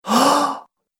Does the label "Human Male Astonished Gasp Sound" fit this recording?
Yes